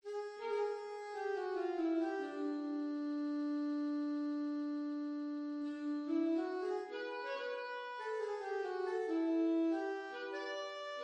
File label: Alto Tenor Sax Track